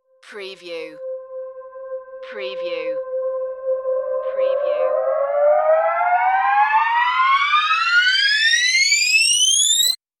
Long Ultra High Rise

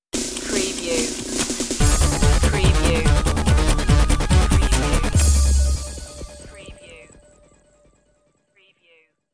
time machine stinger

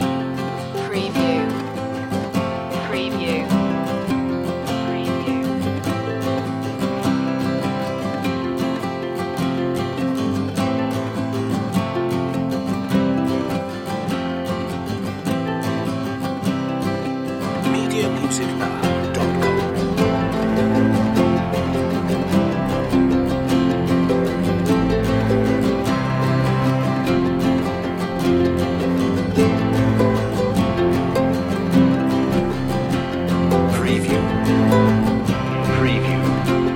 the nu-folk tune no vocal

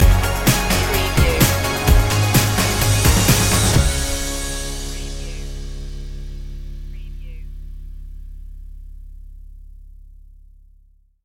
hybrid throwback stinger

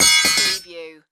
Sword Set Down Heavy

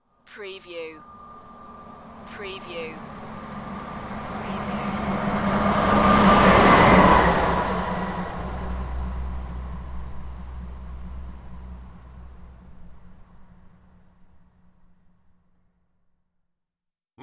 Garbage Truck Drive

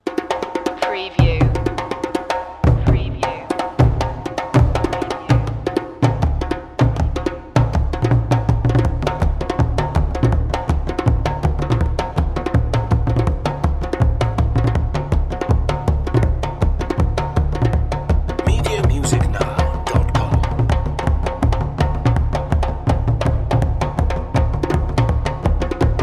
african drums lipango

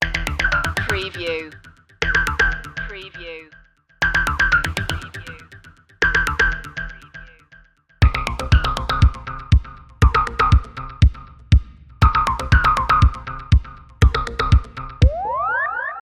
vintage electro sound loop

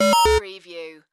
Chime Modulated

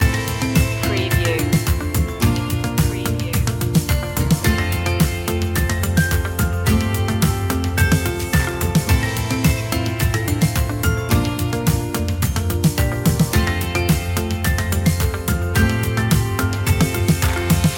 taking flight loop

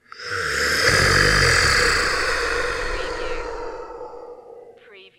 Monster death

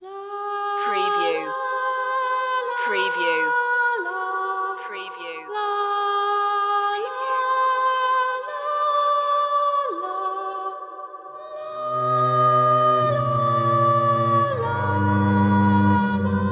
celtic requiem

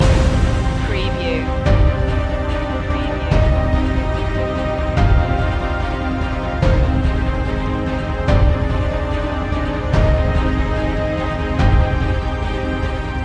human machine loop

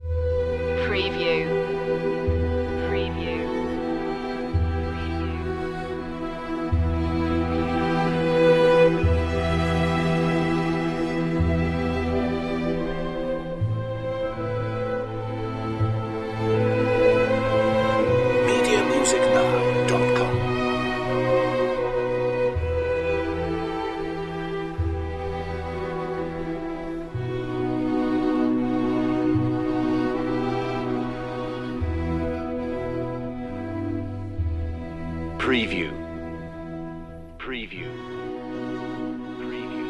string only; Romance Anonimo

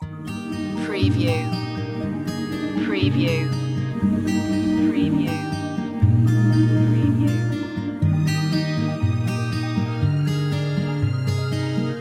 summer glade no birds no melody

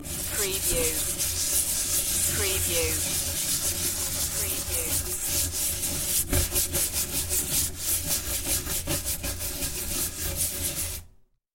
Scrubbing Pan

